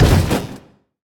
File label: Place Arcade Cab